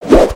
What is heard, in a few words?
hammer; aronwk collection; ndaudio